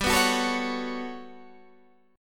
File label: G Major Sharp 11th G down Guitar Half Step Down